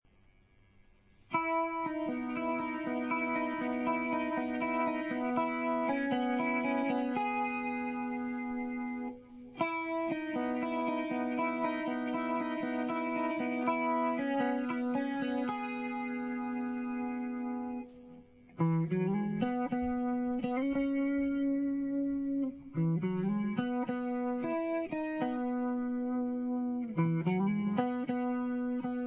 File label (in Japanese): space chorus, サウンド的にはこんな感じの音です。ディレイとリバーブもうっすらかけてます。; ギターは欄堂くんピックアップはフロントハムです。; 特徴はシャープでCoolな感じでちょっとショワショワしてるというか